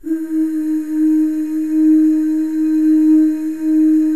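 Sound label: female oos, Voices; FEM.2 OOS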